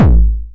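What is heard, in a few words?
909 BD tape saturation, kick drum; Goldbaby Tape808 & Tape909; The Tape 909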